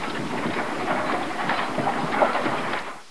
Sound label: house poor; Caesar III